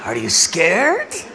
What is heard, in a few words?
scared